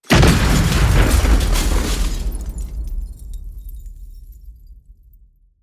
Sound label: explosion and glass debris; mixkit